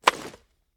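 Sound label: sniper reload raise; gmod; iw8; alpha50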